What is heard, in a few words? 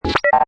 Hi Tech Panel Servo Button